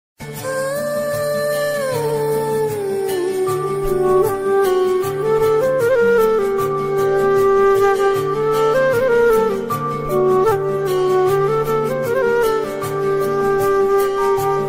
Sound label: love ringtone tamil love ringtone love bgm ringtone; Varuthapadatha Valibar Sangam